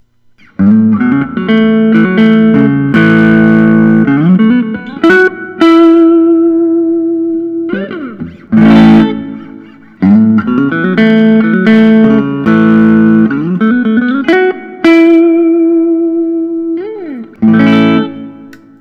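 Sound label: collings city limits iced tea sunburst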